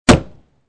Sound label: Bullet Impact Bulletproof Window